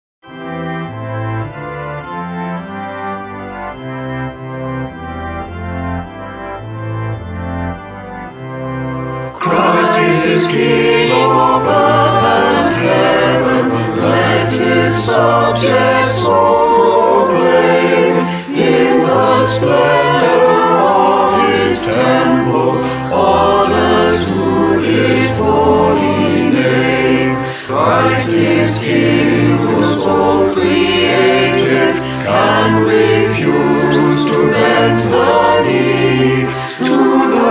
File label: Christ Is King Of Earth and Heaven choir